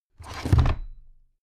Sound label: drawer cabinet crammed close heavy halfway